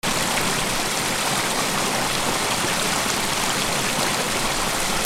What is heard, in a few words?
WATER